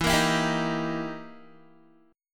E Minor Major 7th Flat 5th, E, down, Guitar; EmM7b5; strummed

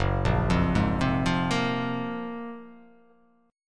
F sharp Major Sharp 11th F sharp; arpeggiated; JGuitar

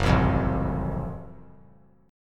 F sharp Minor Major 7th Flat 5th; strummed

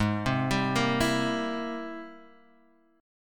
Ab Augmented Major 9th Ab Guitar DADGAD

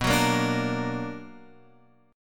B Minor Major 7th, Guitar, Open F; strummed; JGuitar

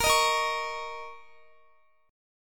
Bb Minor Double Flat 5th Bb, Guitar; Bbmbb5 strummed

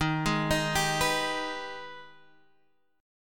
Ab Minor Major 7th

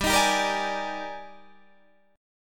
D sharp Major 11th, G, guitar chord

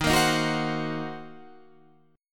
D sharp Minor 6th D sharp, down, Guitar, Standard; strummed; JGuitar

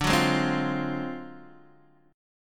D 7th Sharp 9th Flat 5th D down Guitar Open D

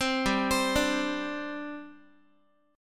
G Suspended 4th G down Guitar; arpeggiated; 4A,4E,5A,5D,5G#,6C#; 15,15,15,12,x,x

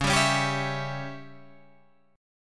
C sharp Diminished C sharp, Guitar Open G; down; chord shape

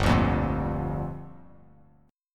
G Minor 7th; Gm7; strummed; JGuitar; 3,5,3,3

